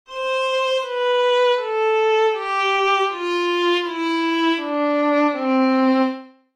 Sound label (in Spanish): Escala Descendente, violin